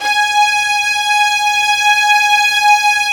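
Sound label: violin section, Arco Violins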